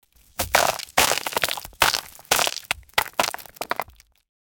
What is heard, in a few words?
fast running on mud, breathing